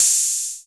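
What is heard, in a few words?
HH, TR-808 hi-hat; 90 s Sample CDs; Sound & Vision - Gigapack I CD 1 (Roland); TR-808 & 909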